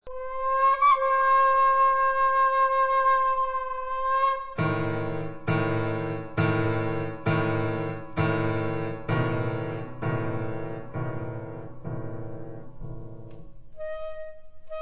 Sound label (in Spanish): Flauta